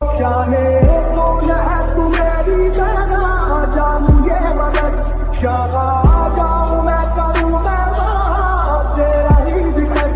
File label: Lofi Slowed Reverb